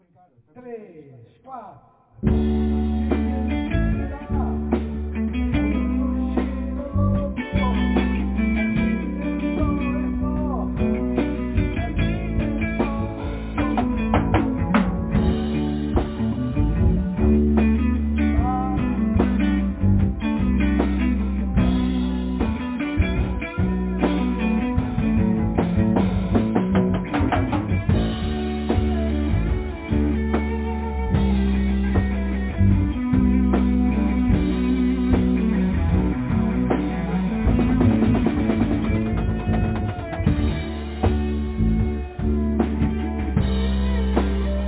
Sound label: Solo